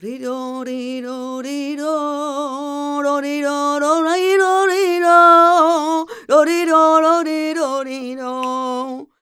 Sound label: voc c, Flamenco, Vocals, Female Cantaora